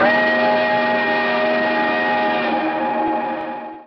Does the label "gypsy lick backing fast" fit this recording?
No